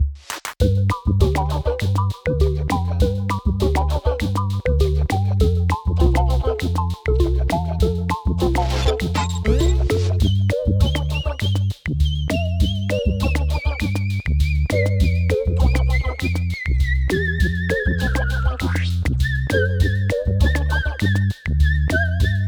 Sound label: Music Elevator; YamaYama; PS4, Windows